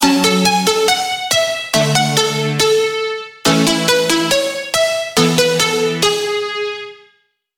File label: Melody Plucks